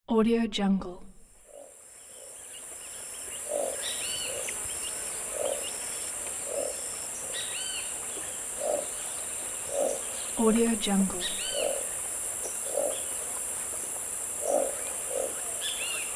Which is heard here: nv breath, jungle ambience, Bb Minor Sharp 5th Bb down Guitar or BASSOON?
jungle ambience